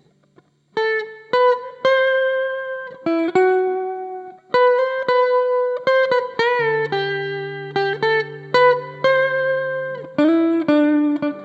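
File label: electric guitar amp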